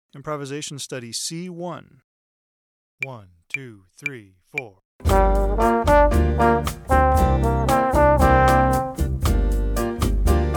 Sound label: bossa improvisation